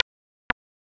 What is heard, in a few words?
One Second Tick